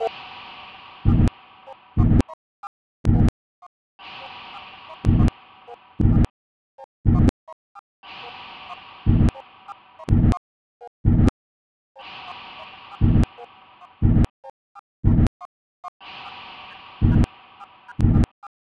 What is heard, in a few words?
Sign Wave